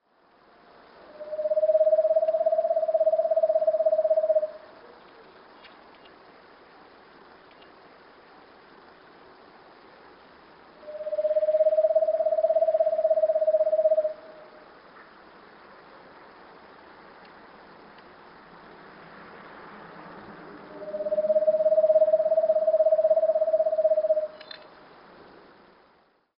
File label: woodpecker owl